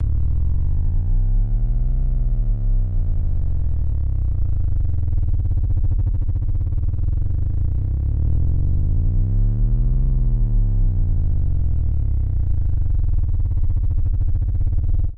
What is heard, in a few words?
ELCTRO SYNTH TONE, drones & tones